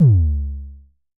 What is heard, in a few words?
Mild Thump Kicks C#2, Drums, Vermona Kick; LookIMadeAThing Kicks; Mild Thump Kick - Precise